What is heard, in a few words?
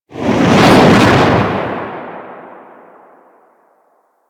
Infested Golem Hand Swing; Warframe